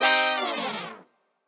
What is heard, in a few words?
horn one shot salsa Cmin; ANDRS MRCIO; boom